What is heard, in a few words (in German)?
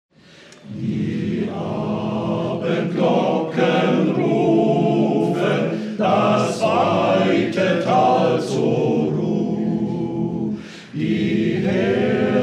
Chor